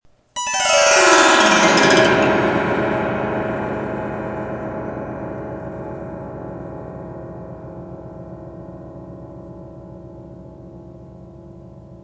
autoharp boo

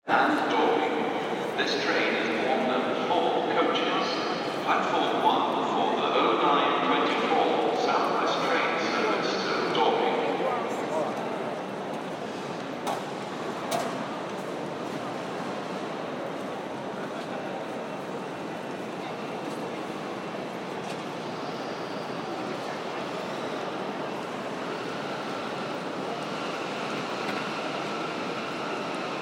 waterloo station

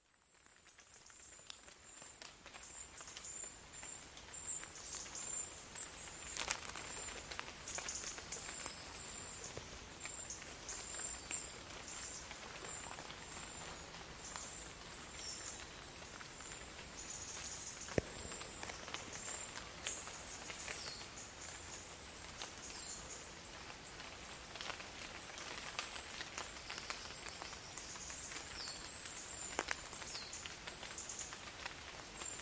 soundscape rain in the forest

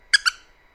squeak

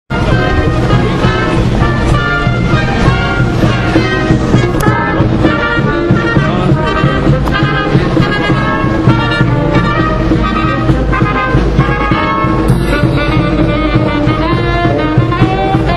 northumberland street band

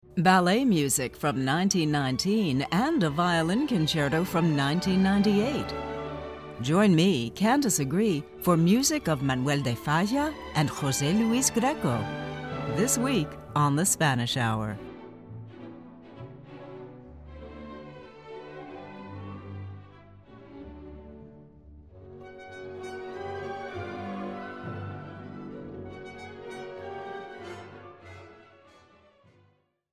spanish classical

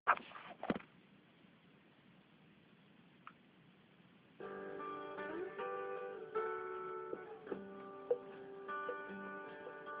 verse and chorus

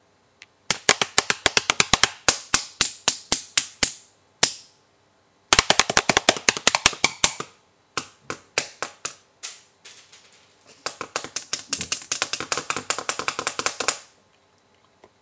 banging

